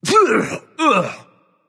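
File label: nonverbal clear throat; smite; voicepack; merlin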